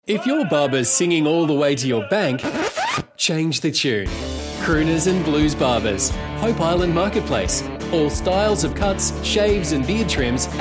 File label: session; Crooners and Blues